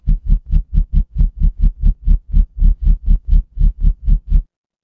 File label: mechanical engine